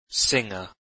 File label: singer